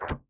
Perc Metallic Percussion

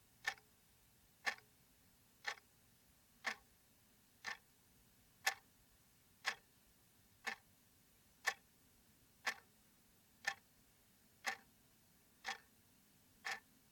Clock Ticking Loud Metal Clock

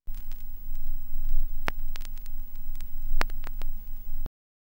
Record Noise

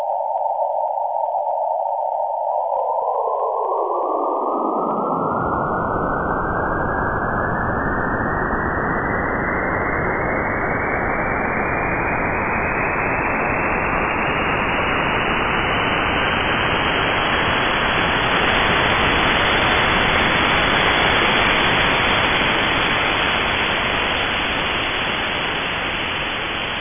Start Ufo Outside

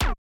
Silenced Pistol

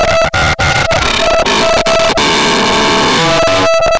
Short Glitch

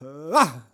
Male Attack Grunt

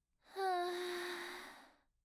Cute Anime Girl Voice Sigh

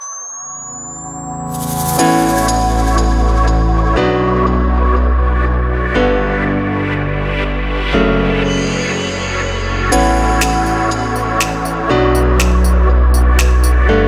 Intro Melody 121 Bpm

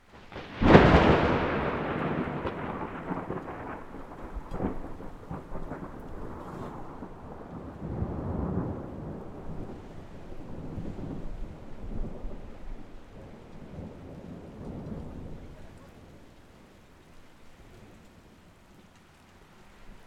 Thunder

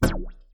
Laser Gunshot

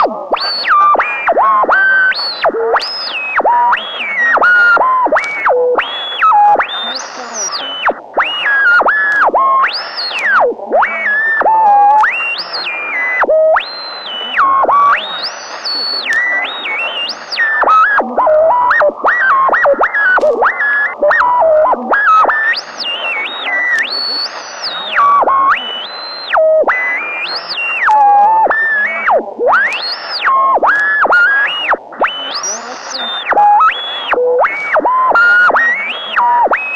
Radio Control Tune

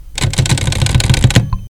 Typewriter Fast Typing

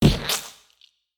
Vocal Splat

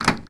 Elev man door latch

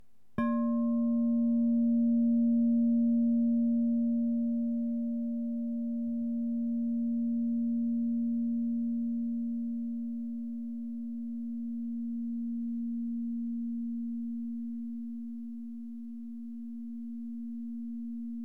Crystal bowl

